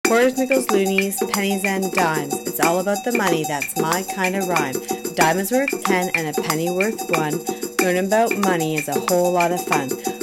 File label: full performance of financial rap